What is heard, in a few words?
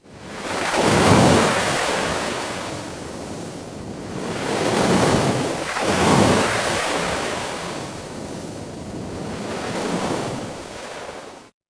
ambience ocean